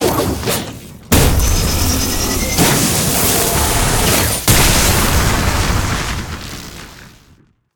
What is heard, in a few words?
shock bolt; mech module